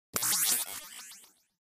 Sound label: Button Sci Fi Beep T, buttons & beeps